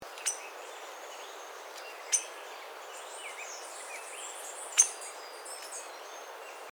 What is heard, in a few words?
yellow thighed finch